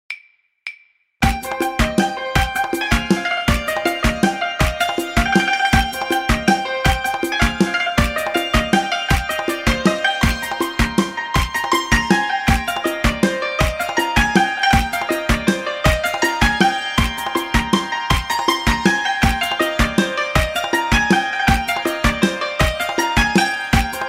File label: Santur, play back